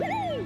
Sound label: woo hoo